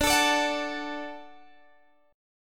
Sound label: D-Major, D, Guitar, Standard; x,17,19,19,19,17; strummed; JGuitar